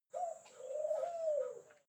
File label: hens and pigeon short; natur-tiere; huehner